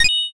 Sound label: notify follow